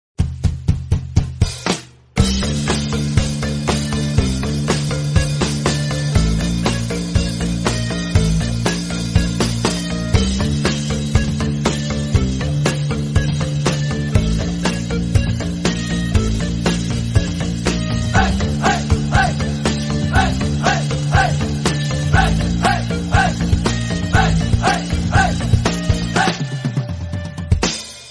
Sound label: bgm ringtone telugu bgm ringtones south indian bgm; agent sai srinivasa athreya movie